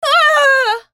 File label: dying; Janna